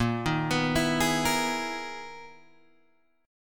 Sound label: B Major 13th A sharp, guitar